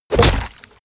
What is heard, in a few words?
club impact crack wet